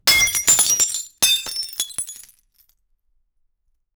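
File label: glass breaking no background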